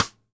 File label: Ludwig Supraphonic Snare Cross Stick Room; Sonic Foundry (Sony Creative Software) - Vital Drums